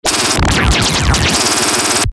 Banshee

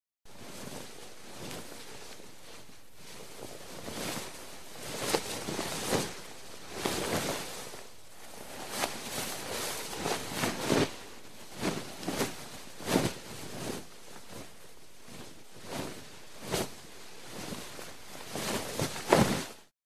Cloth Dress Movement; Hollywood Edge Foley Sound Library

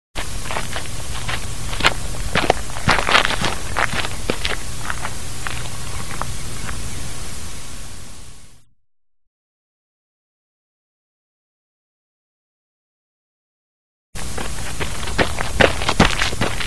Walking across dirt lot.; SOUND EFFECTS1

